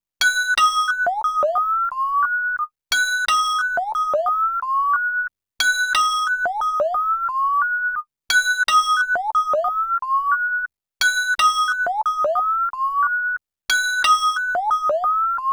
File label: Melody, Hip-Hop